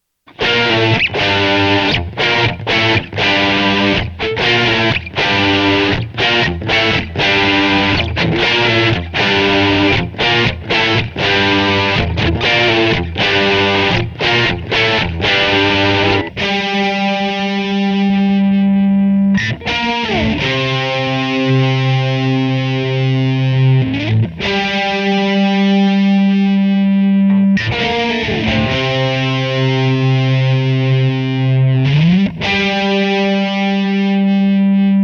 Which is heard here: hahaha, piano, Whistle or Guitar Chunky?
Guitar Chunky